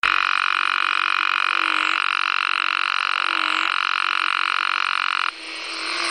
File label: Ceratophryne geayi